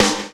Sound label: DANCE SET, DRUMS